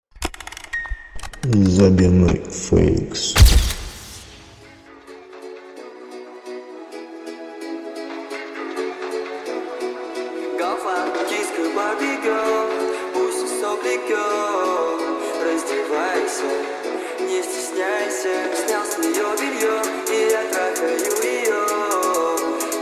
Bass

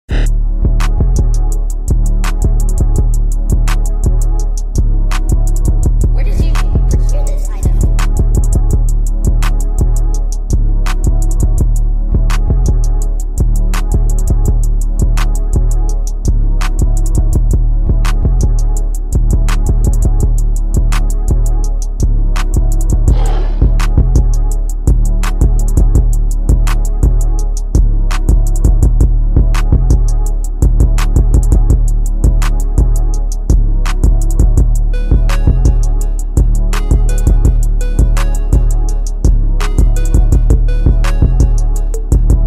Type Beat

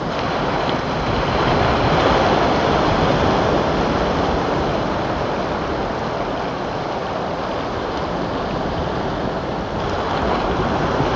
Easy Ocean Waves

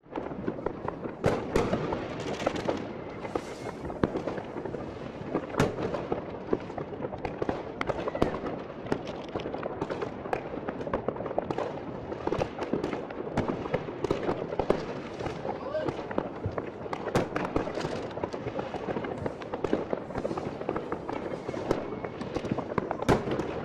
Festive Fireworks Noise

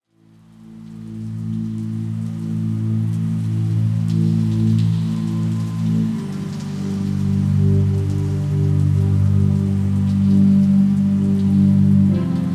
Calm Singing Birds

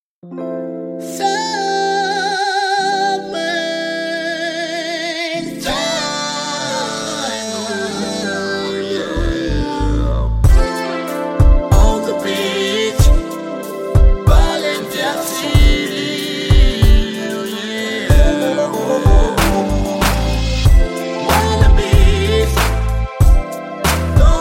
Groove

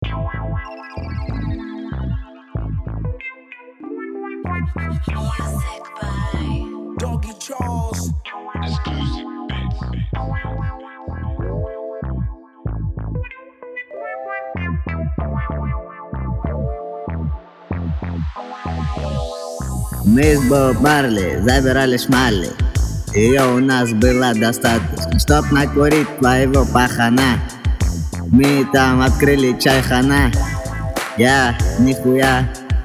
armenian hip-hop

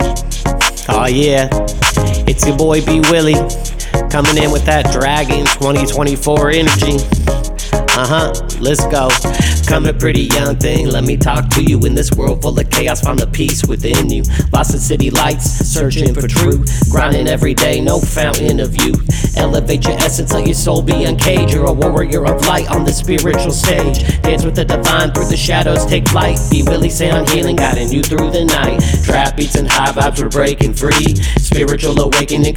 Trap Beats

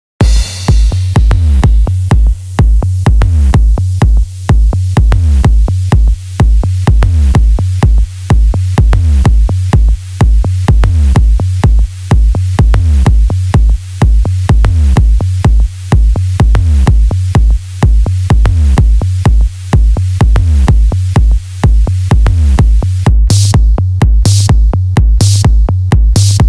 Electro House

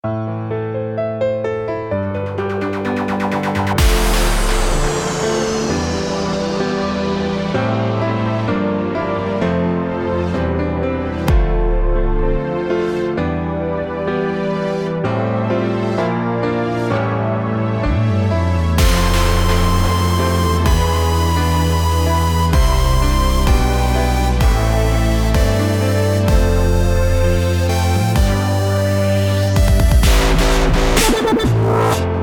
RAINSTORM Inst.

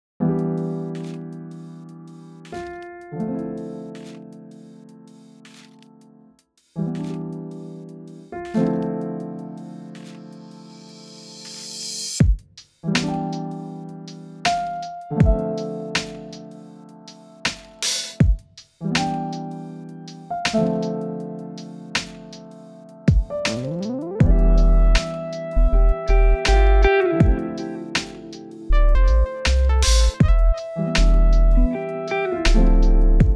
HIP-HOP LOFI Chillout Lounge

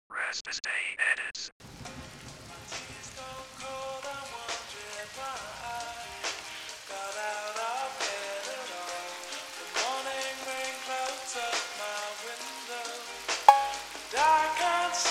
slowed reverb lofi

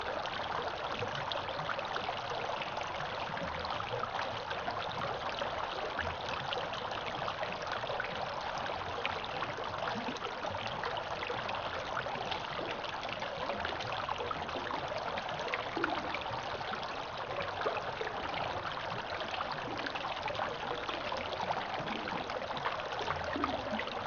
Binaural Beats Brain Waves Isochronic Tones; Meditation

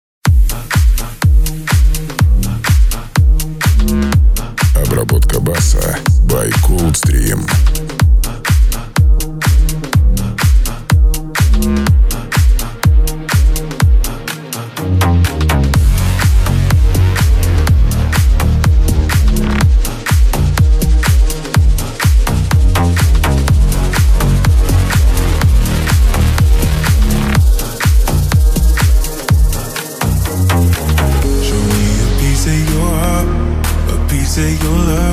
Bass

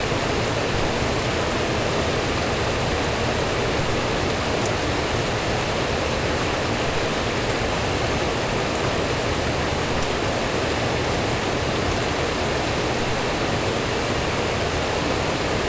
Thunderstorm Rain, Wind and Rain

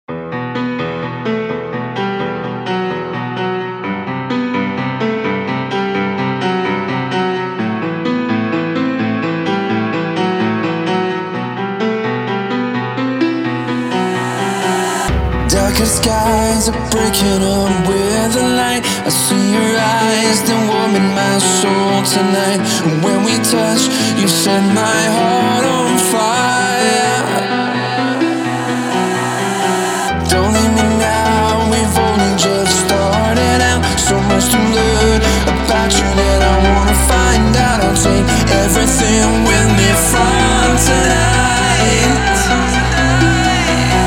Instrumental version

